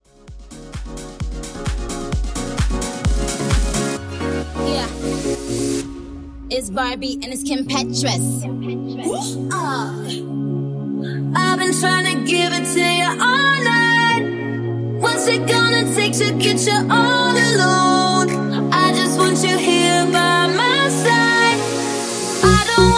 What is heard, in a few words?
Mashup; Remixfreakz